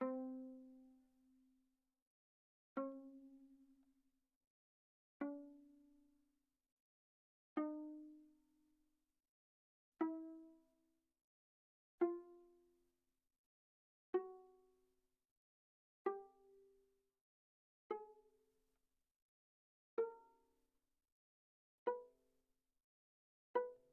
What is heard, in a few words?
Viola pizz sul G mf C4 B4